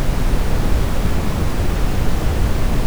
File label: Brown, noise; Logic Pro X; Alchemy; WaveNoise